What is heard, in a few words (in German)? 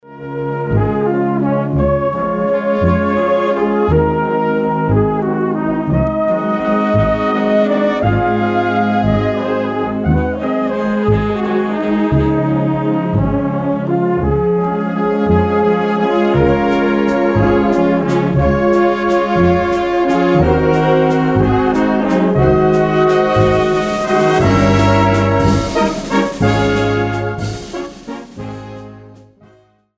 Walzerperlen